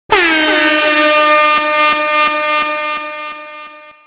Air Horn

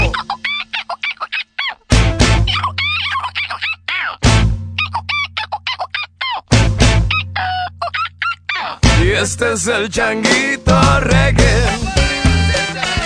changuito reggae

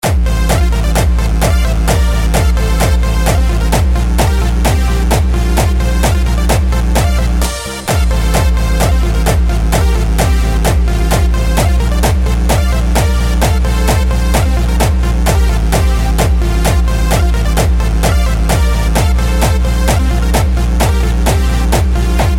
Hungarian dance

